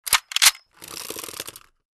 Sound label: chaingun Sound